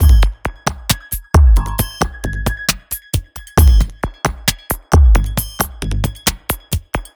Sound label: HipHop Combo Gmaj; Digidesign; Pro Tools Essential Loops